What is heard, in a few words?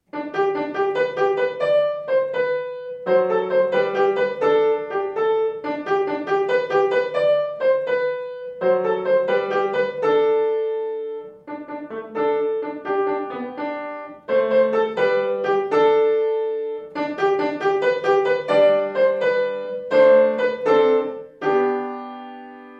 Gigue